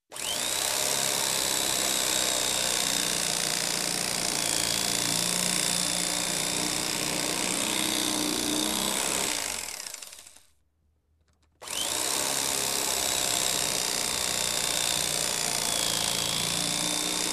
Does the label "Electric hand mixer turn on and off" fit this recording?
Yes